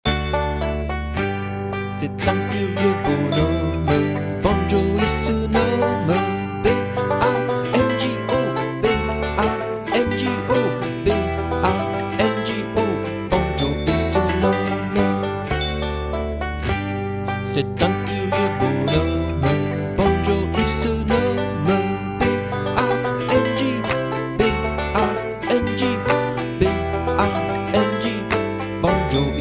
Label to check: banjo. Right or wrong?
Right